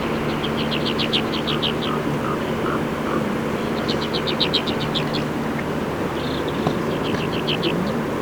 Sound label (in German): Alpenbraunelle; Karwendel; Michle Peron